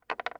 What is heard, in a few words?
undo; gomoku